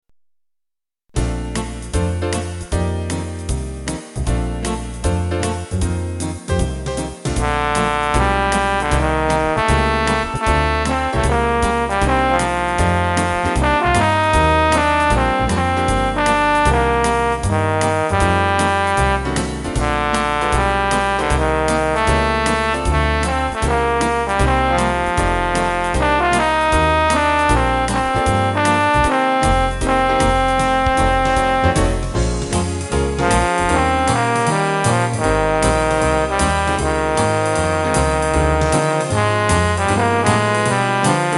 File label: Jazz